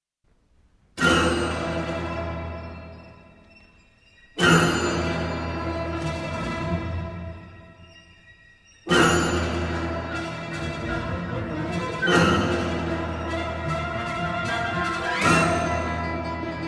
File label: Piano Concerto opening; Montague